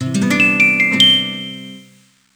power melody; Netgear SPH200W Skype WiFi Phone; SystemRingTones; poweronoff

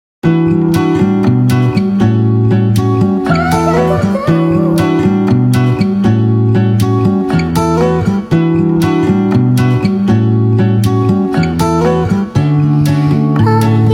Chilled, Ringtones, Nokia; 3600 slide

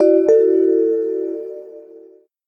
new message; Asus Padfone-2

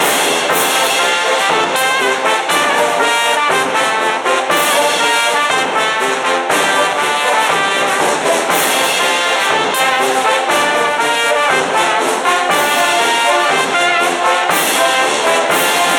Marching Band, ringtone

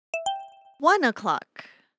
Hourly Alert; SKY; IM-U680L; System Sounds

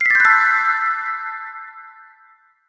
Connection lost; HP iPAQ Glisten

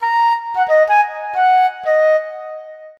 Flute; LEAGOO; M9

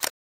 continuous shot; LG K10 T-Mobile

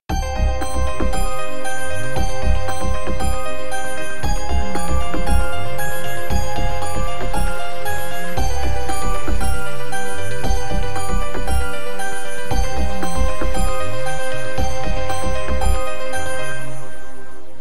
Bell in Space, ringtones; Asus Zenfone-3-Deluxe

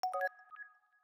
Cube To List; Samsung SCH-M710 T Omnia II

